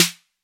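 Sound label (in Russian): малый барабан одиночный яркий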